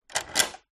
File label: household key mortice turn internal door unlock; zapsplat